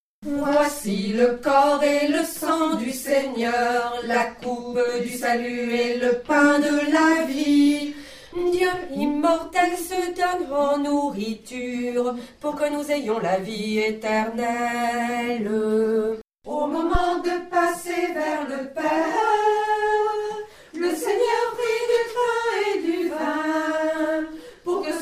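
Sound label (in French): Voici le corps et le sang Alto, chœur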